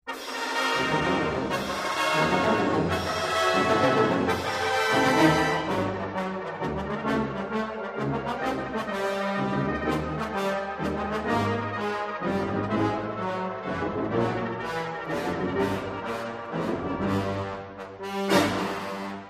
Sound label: Fantasy For Brass, Brass Band; Sir Malcolm Arnold Opus 114